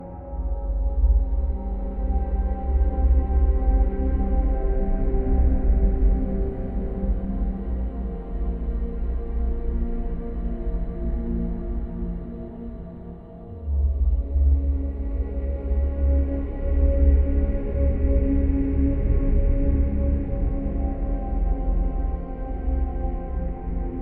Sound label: Chamber Loop; assassin s creed chronicles india; First Civ Chamber